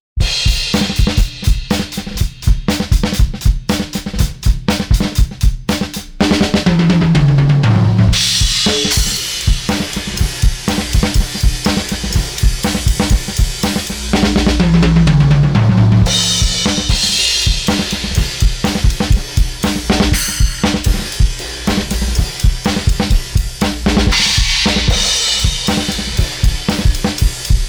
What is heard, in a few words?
drums; smart EQ 3; low bypass